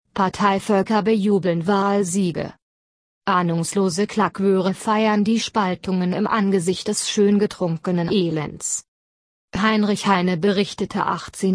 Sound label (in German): Wahlen Siege Jubel